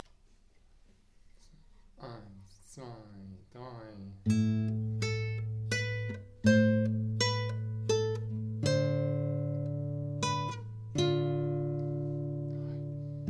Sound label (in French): valse mel 80; Valse dimanche mel 80